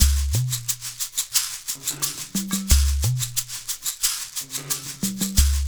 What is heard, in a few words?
LOOP, Percussion